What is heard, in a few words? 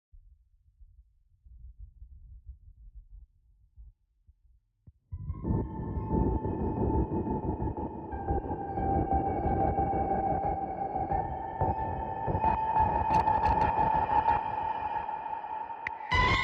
Lead Synths Only